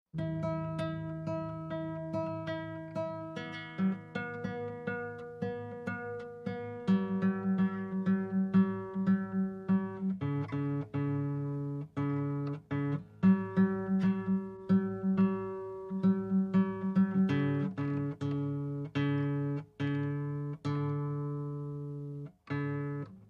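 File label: Acoustic Guitar Tuning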